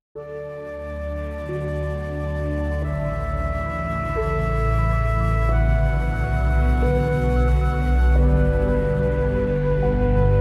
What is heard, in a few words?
Ethereal Magical Reverie